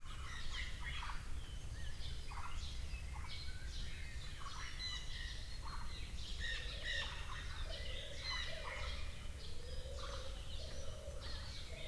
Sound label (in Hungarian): a madárhoz közeledve, emu; miskolci zoo 2009